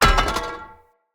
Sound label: Spear Drop; Weapons; Enemy; Spears; Nimrod Spear